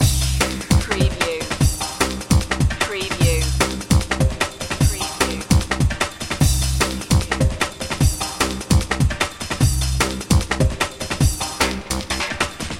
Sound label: aviation loop; dance; trance techno